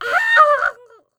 death; player

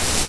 forcewalk

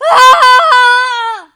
death; angelyss

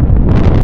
implode

